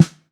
Snares; Birch; Ghosthack

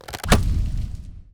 Attack Fire Arrow; Game Essentials

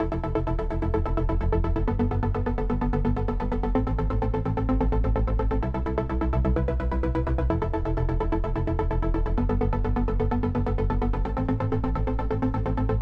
128 BPM A Min Chords; Loop Stems; Cymatics; Infinity; Breakdown Loops

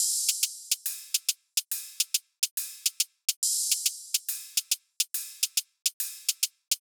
Riddim Drum Loop 140 Top

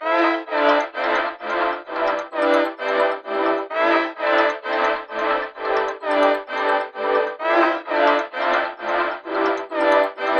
130 BPM A Min, Melodies

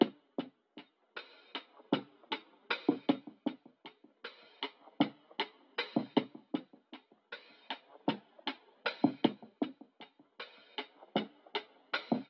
Frame Percussion Loop, 78 BPM; Percussion Loops

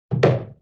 Wood Hit

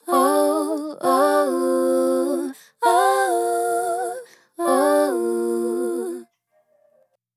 Ad Lib Crystals - 130 BPM G Min Dry, Vocals; Cymatics; ZODIAC Beta Pack

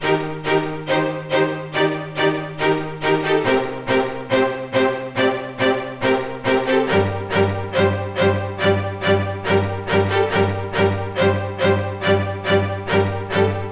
String Loop - 140 BPM G Min; String Loops; Future Bass; Instruments; Scarlet; Cymatics; Future Bass Starter Pack

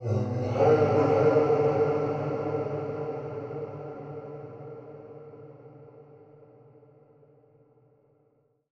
110bpm E, Vocalpack

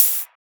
Ride, Cymbals; Falcon

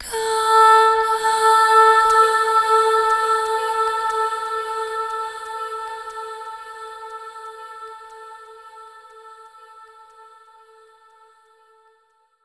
Granular Vocals Short - Am 60Bpm; Construction Kits; Ghosthack; SkyRealm; Kit 29 - When The Bell Tolls

